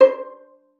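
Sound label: violin pizzicato C5; Xsample VOL-5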